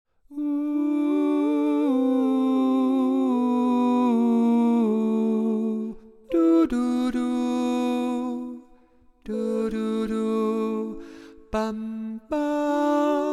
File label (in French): alto